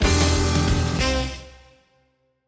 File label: medium win; jackhammer